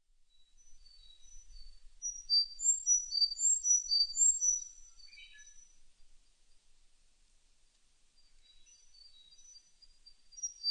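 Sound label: Sakhalin leaf warbler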